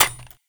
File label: Bird Three Single Step; Ambience; Warframe; Entrati